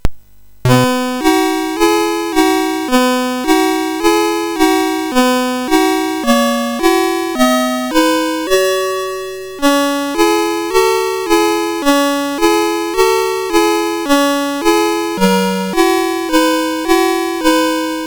sid MOS6581R4, GAMES; Forest of Doom

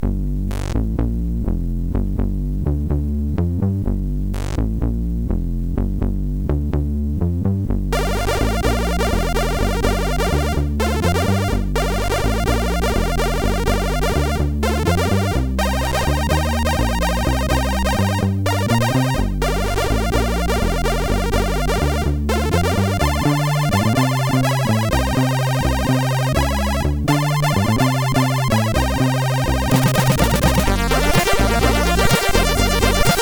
Blues, MOS6581R4; HVSC; Argon

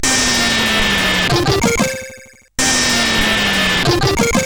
Tecnetium, CSG8580R5

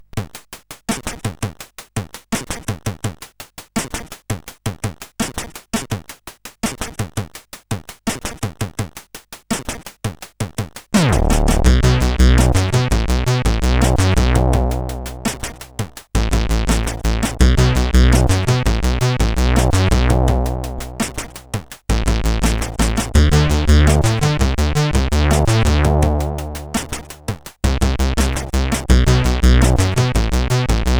Loader, CSG8580R5; Zak Creatures

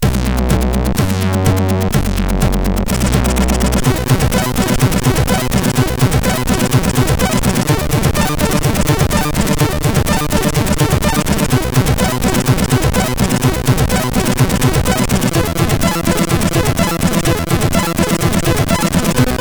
Little Thrash, MOS6581R3; hvsc

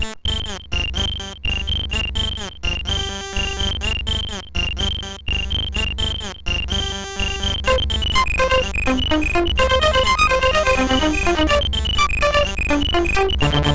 Mix, MOS6581R4

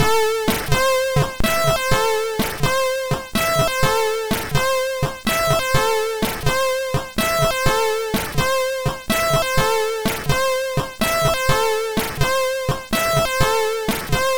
Jingles, MOS6581R3

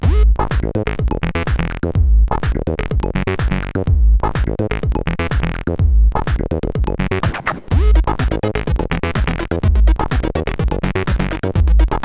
sid, CSG8580

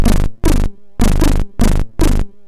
Old Music, MOS6581R2

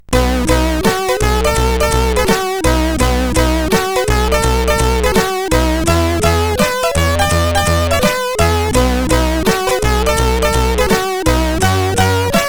Just a Test Tune, CSG8580R5

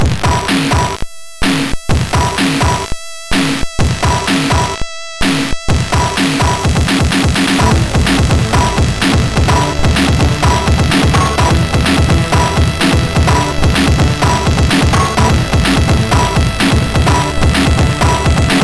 Sad, sid MOS6581R2

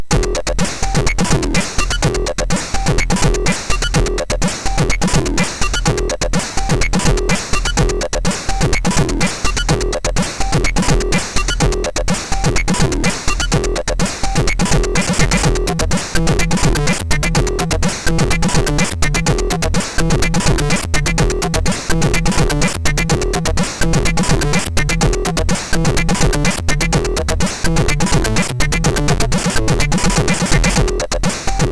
Newsynth, sid MOS6581R3; Morton Adam

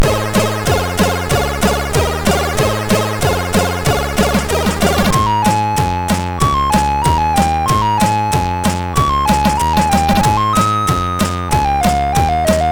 Short Funny, MOS6581R3

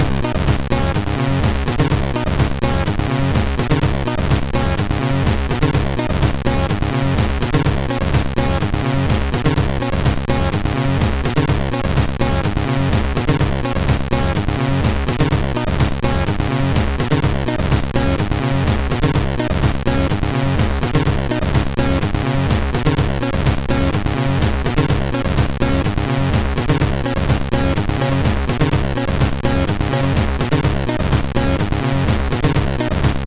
Music, sid MOS6581R3; Force

